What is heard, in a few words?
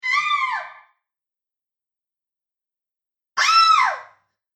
Two Female Screams; Screaming Sound Effects